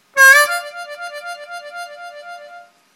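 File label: trill, harp; Трель на отверстиях 4 и 5; губной гармошке; Трель на губной гармошке – это очень красивый эффект.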